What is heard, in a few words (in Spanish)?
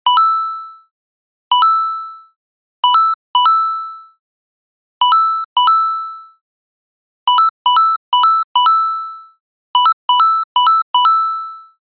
monedas varias veces; mario